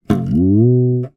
cartoon twang bounce boing spring

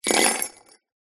bells sleigh bells down on table

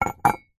household cup mug set down on concrete; zapsplat

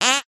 cartoon fart

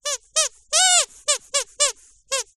leisure toy squeaky squeeze sequence

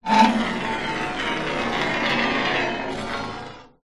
metal scrape on metal bar strip

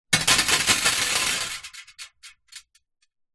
clothes hanger metal wire set down on glass table; household

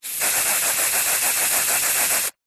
aerosol can spray med

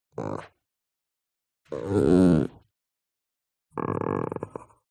DOG Chihuahua Growl Soft; ANIMAL; smartsound

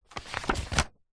household book manual large page turn; zapsplat

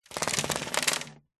blinds venetian rub slats with hand; zapsplat

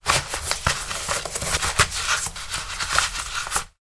foley boot work shoelace tie; sound effects one

